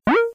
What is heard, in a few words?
phaser Up; kenney digitalaudio